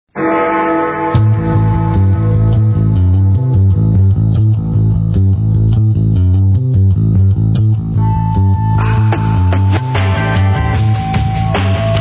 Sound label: welcome music